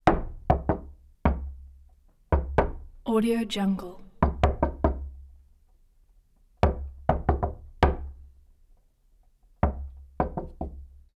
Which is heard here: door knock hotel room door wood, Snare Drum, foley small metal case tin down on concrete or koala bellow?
door knock hotel room door wood